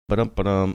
singing ba dum ba dum

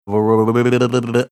making sounds with tongue and lips

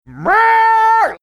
making funny cat sound

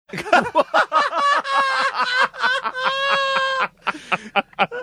having a good laugh

